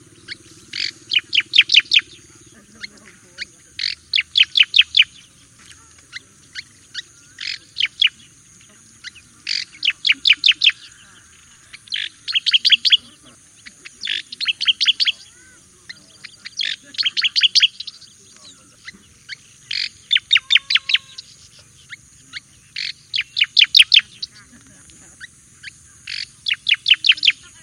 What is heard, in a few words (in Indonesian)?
suara cicakoreng jawa